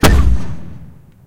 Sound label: atgm punch